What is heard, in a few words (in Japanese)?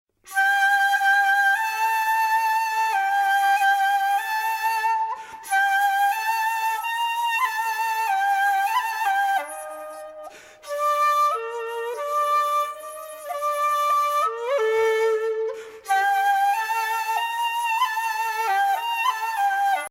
尺八